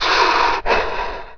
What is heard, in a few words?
breathe; PC Gamer IT CD 41; Daikatana; superfly